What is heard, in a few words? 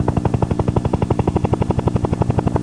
HELI ATTACK; Beach Head - Desert War